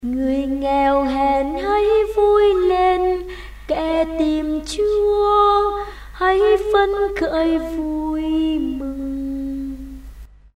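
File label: soprano tenor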